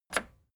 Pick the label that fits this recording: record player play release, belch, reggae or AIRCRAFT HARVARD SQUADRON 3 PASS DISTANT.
record player play release